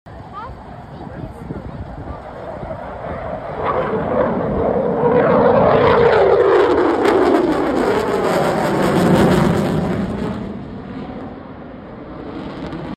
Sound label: F 22 Raptor; رپتور